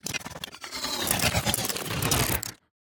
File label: robot morph movement; fvtt sonniss gdc audio